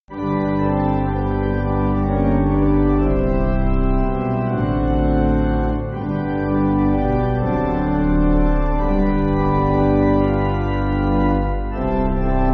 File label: Pipe